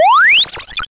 bonus Ammo; nerf-ultimate-party

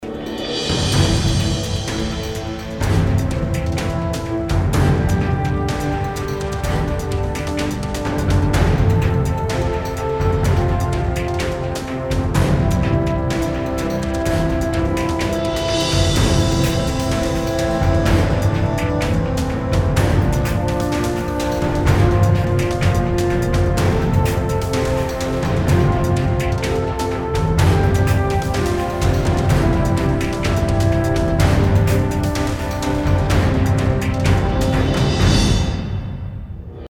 music; cheesy-world-of-adventure; machuPicchu

